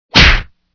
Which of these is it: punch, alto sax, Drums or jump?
punch